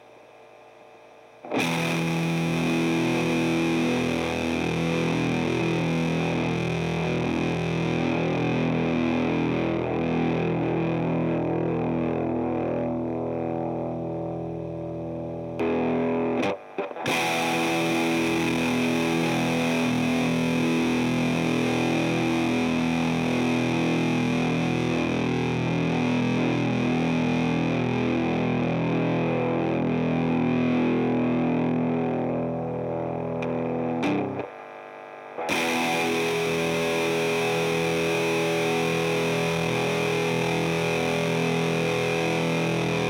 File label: full anger